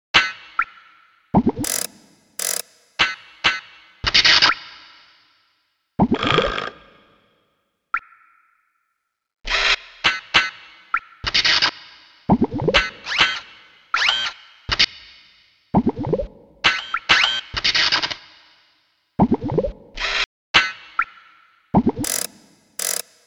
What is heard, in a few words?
Workshop Ambience; gba; Spyro Attack of the Rhynocs